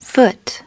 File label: foot